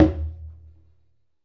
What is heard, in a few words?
Conga High; Essential Percussion